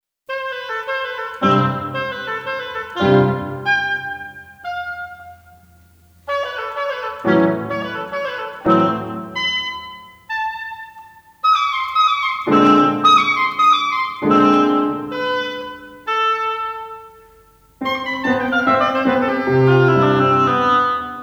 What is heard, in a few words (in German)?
für Oboe und Klavier